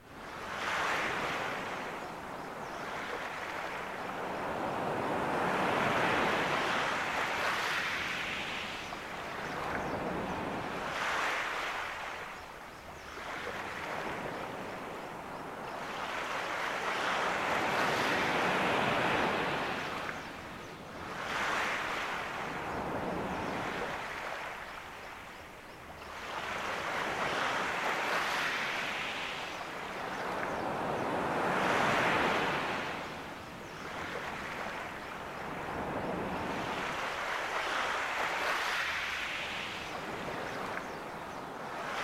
Ambience - Beach

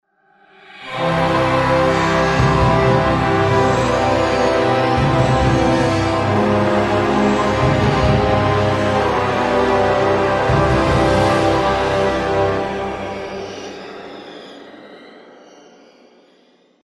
Win Battle; Inferno; heroes of might and magic online 2010 pc

